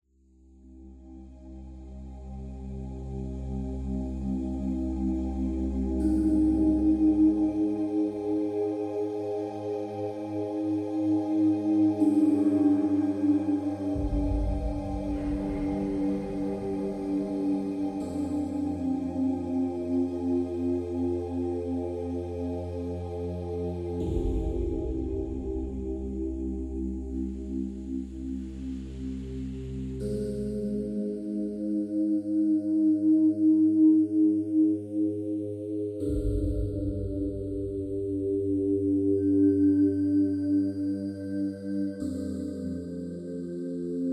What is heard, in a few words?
ambient